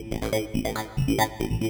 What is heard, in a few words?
TECHNO SYNTH 140; Keys